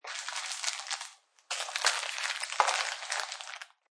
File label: Plastic Wrapper Crinkling; Plastic food wrapping or package such as chips or cookies crinkle sounds.